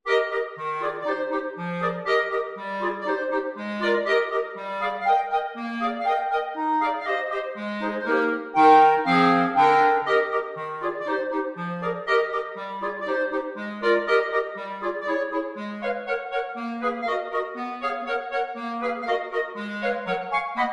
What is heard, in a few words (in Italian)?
quartetti per clarinetto